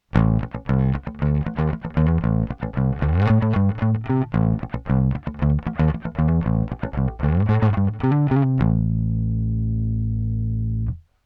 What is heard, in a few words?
Clean EQ Drive Blend LF; Walrus Audio Badwater; Drive50 Blend50